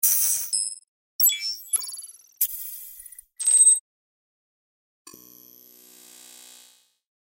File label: alerts; firok